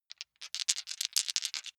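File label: big three rattle in hand, wuerfel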